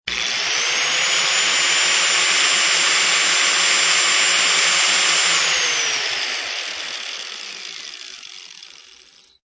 Disc sander working in the air, start and ending noises, long; Sanders (Sound Effects:Machines); Lynne Music